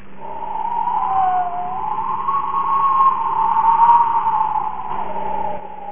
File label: wind